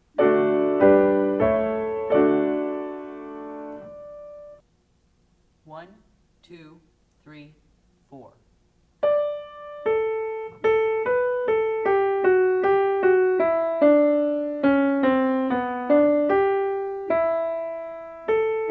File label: aural melodic